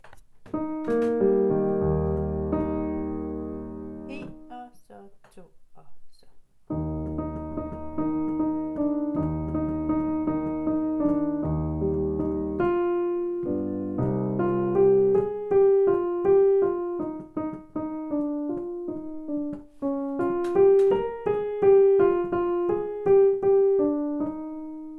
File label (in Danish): ALT uden sang